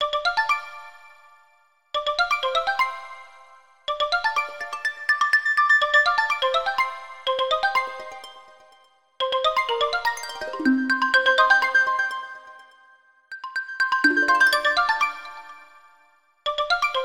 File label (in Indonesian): Nada Dering Nokia Jadul; Heavenly